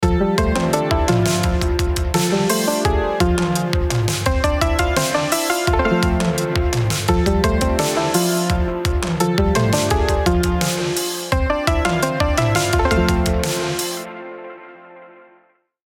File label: mazurka